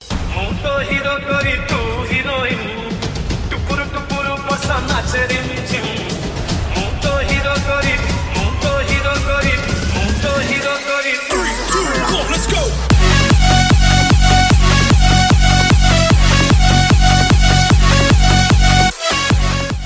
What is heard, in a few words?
Odia Dj Song Ringtone